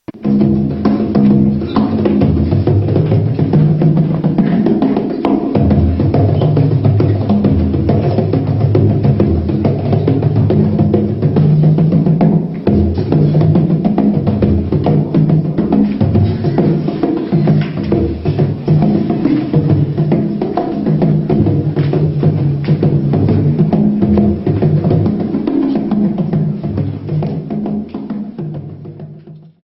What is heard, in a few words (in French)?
instruments bodhran